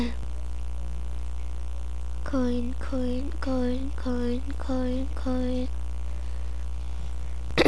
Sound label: coin